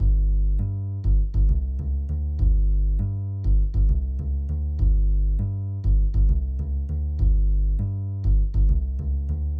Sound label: Bass, 100 G